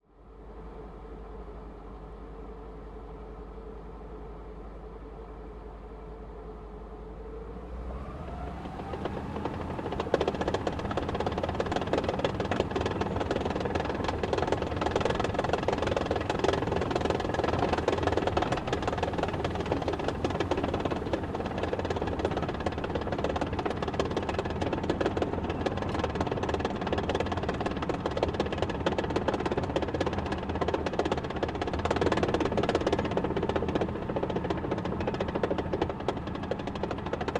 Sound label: M18 onboard medium driving reversing stopping tracks right, Hellcat Tank Destroyer